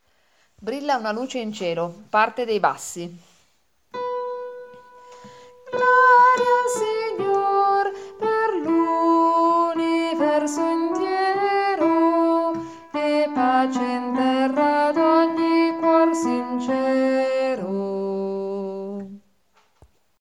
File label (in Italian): bassi